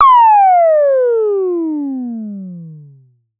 Pollard Syndrum

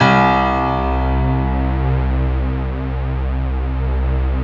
piano string; Sound & Vision; Gigapack I CD 2

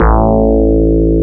FM Synth Bass, Roland; Club-50 - Foundations

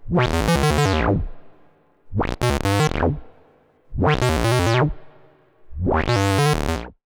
Odd Modular; radiosonics

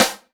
SD, Dance Drums

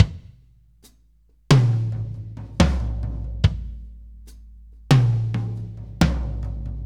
Loop, Drum Tools, Laid Back; Sonic Foundry (Sony Creative Software); Producer Dan

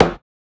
GLASS; Spectrasonics; Metamorphosis; GLASSBABY

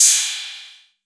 PERC 808

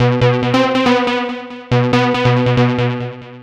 NRG Lead, trance

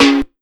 Ragga & Dub Reggae PERC

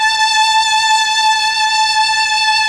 STR, STRING

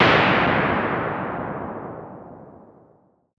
loop; X-Static Goldmine 3

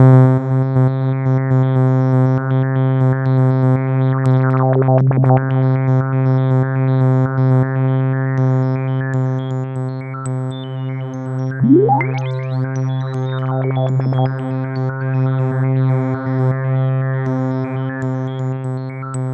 Roland JV 2080, interval; MG INTERVAL

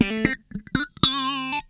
FAZE PLUK, Funk Guitar; 90 s Sample CDs; Zero G

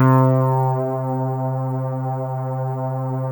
Dance Floor Bass, Multisample Basses

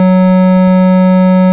ORG, Farfisa Clarinet

